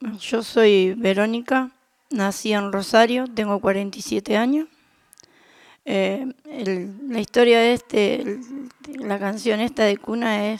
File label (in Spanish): canciones de cuna